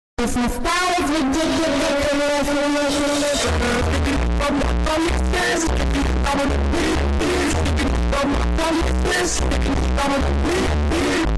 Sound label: bass; skibidi toilet